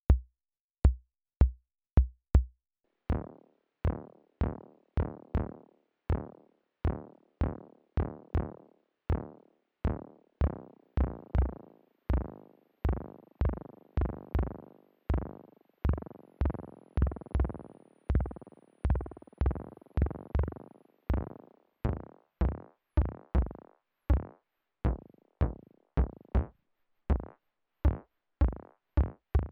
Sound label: Flanger Bassdrum; Dreadbox Hypnosis